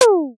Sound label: comedy question pop; reaction